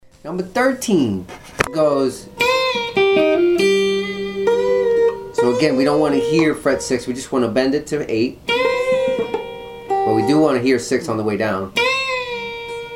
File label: string bend lick